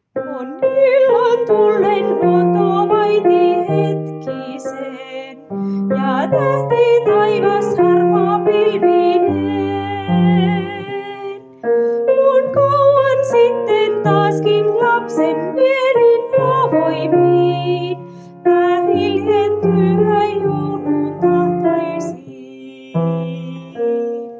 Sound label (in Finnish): Tähti, tähdistä kirkkain (sopraano)